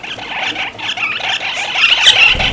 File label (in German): verscheuchen, Katharinasittiche; Für kurze Zeit hat man den Eindruck, es käme zu einem Wortgefecht, bis einer der Vögel wegfliegt.